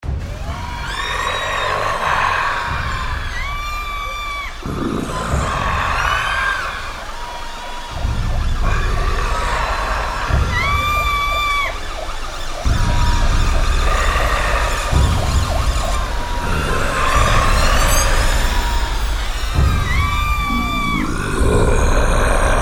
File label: Giant monster walking through a City with a thud with crowd screams; Giant monster walking through a City with a thud with crowd screams and chaotic sirens; Monsters